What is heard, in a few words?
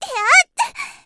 attack; Eternal Return; Tia; Voice Line; KOR